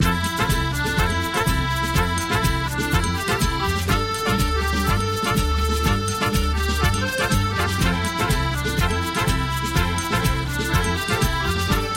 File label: calypso